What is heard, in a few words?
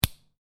Lego Connect Two Lego Pieces; Miscellaneous Toy Sound Effects; Sound Effects:Toys